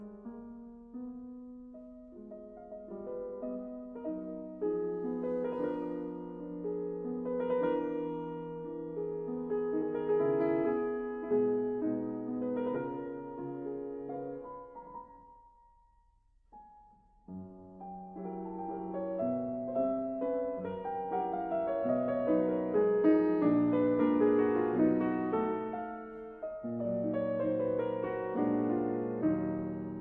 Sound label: Mazurkas in E flat minor; 10 Mazurkas Op 3 No 10